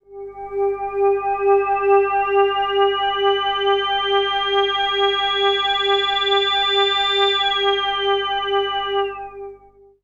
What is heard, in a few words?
DEEP PAD G3; DEEP PADS; Explosive Jungle; USB Soundscan vol.05; AKAI